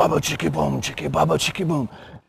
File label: bubba chicky boom, beatbox; Drum loops